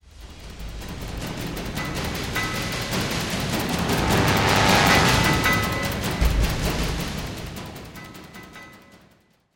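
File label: sounds of endings outro, disappearance fading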